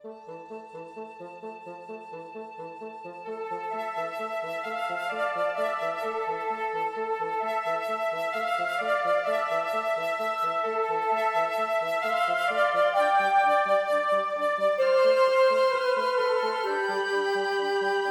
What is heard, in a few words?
Music Rush Woodwind; 7 Mages